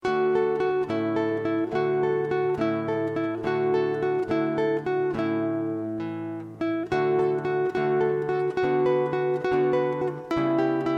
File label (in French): concerto 3eme mouvt; vivaldi antonio; concerto majeur